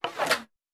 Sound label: Weapon Draw Wood; Fantasy Sounds Bundle; Medieval Combat Sounds